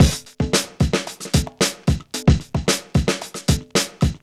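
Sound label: 112 bpm, Funky loops; Zero-G; Funky Elements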